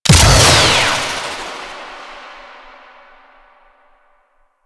Hand Rocket Launcher Charged Shot; Crp Hand Rocket Launcher

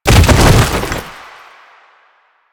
Bodyfall Default; Warframe; Lasrian; Lasrian Soldiers; Foley; Body Falls

